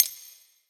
Kitchen Foley FX Drums; Antidote Advent; Kitchen Foley Sounds & Loops

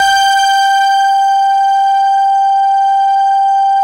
CELLU G4, Yamaha VP-1; Music Store; 90's Sample CDs; CELLULOID

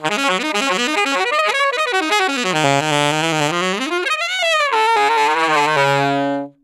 Alto Free, Sax; Sonic Foundry (Sony Creative Software)

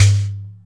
EQ Perc, Percussion Mix

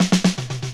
Fills, Drum&Bass; EZRollers

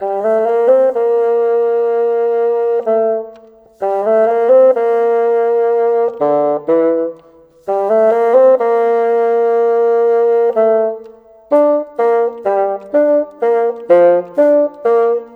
Bassoon, Orchestral, Rock Pop; Sonic Foundry (Sony Creative Software); Orchestral Series VOL-4 - Rock and Pop

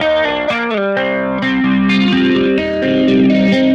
Guitar, 128bpm, C2, analogue circuit; musicradar

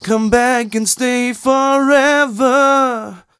m 127 mel a, Vocals

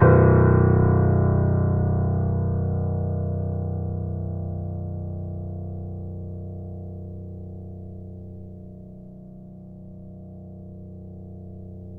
Grand Piano Mp B-1; AKAI S6000 CD-ROM - Volume 2; Grand Piano 256M

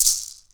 PUILI, HAWAII PERC

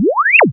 Zap C3; Zero-G; Club Techno

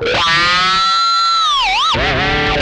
whammy fx, guitar

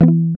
GUI E2, Acoustic, Roland

